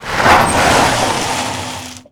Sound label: tire skid dirt short